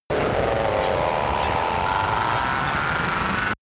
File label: facility error horror, sciene-fiction